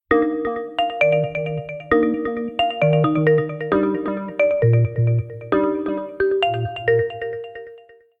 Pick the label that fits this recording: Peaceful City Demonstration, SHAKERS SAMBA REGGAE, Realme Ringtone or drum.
Realme Ringtone